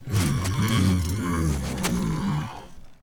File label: WAR IDLE; HUMBABA; Mob